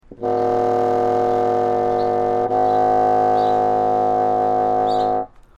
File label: resonkeys